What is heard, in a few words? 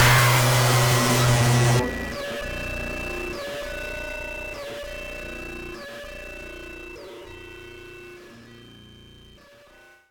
Hit